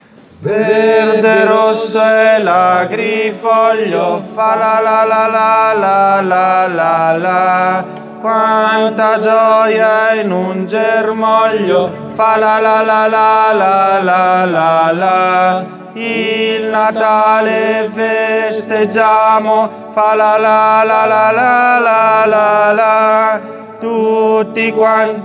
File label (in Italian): tenori